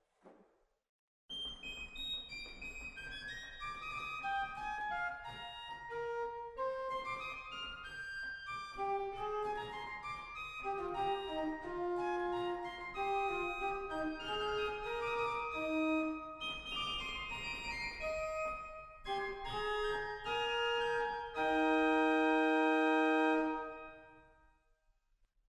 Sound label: Octava2; waltershausen